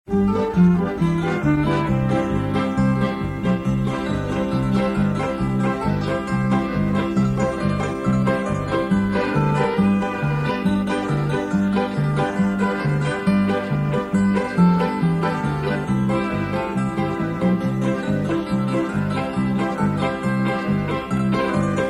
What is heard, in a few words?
polka